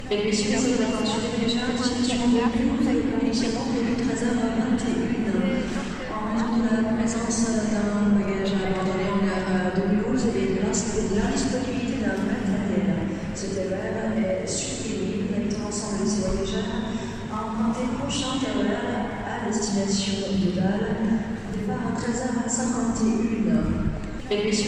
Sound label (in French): sncf melodie